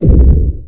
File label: explosion; hyperrogue